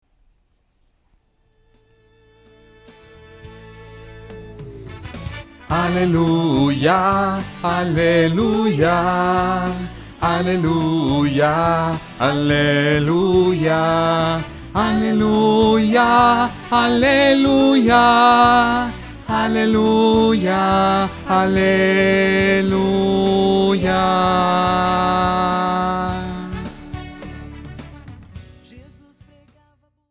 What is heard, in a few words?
Aleluia tenor; jmj2013